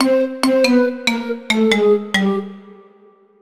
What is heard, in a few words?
lose